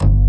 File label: JAZZ ORG, BASSES; Pro-Rec - Synthsonic